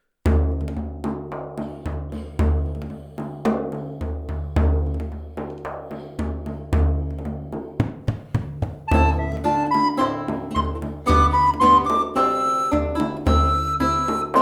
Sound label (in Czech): flétna + loutna + bicí; M. Praetorius : Bransle de Montirande; Flautoškola 2 – METODICKÉ NAHRÁVKY a DOPROVODY